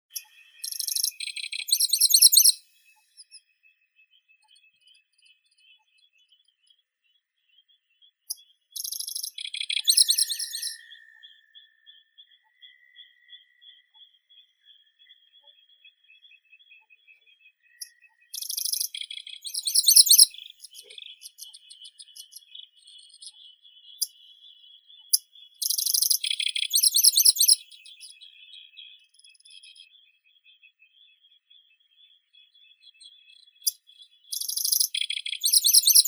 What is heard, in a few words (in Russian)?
sverchok pevchii locustella certhiola, zvuki prirodi